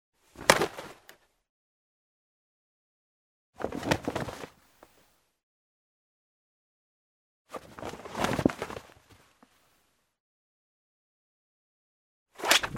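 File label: sound of shaking clothes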